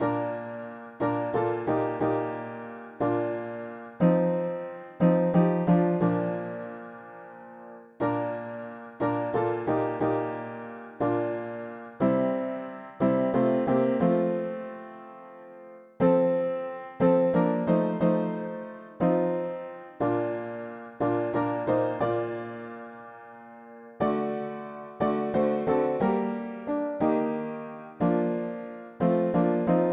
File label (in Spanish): mono piano; hymns; Himnario pequeno 2Pte